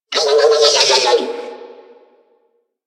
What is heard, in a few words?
Necramech Repair Drone Idle Surprised; Warframe